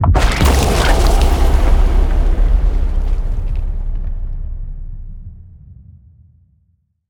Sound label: erupt; smite; god cthulhu